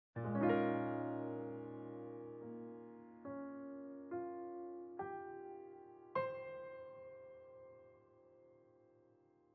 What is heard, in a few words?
Museum Boss Piano End; unsighted